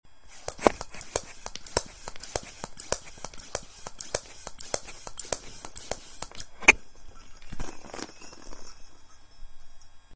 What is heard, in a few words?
hand pump